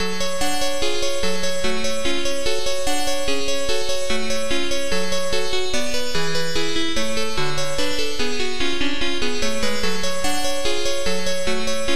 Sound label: Tetris Music